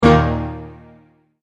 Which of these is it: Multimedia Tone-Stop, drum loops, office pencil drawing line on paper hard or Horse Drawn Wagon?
Multimedia Tone-Stop